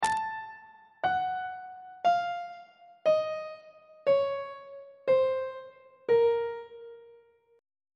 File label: a sharp harmonic minor scale descending; on treble clef